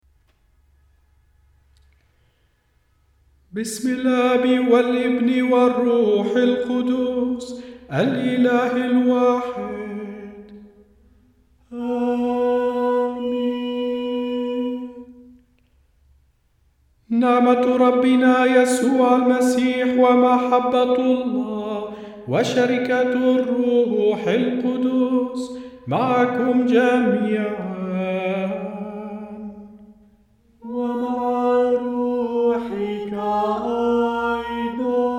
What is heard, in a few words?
Mass Order Opening Rite melody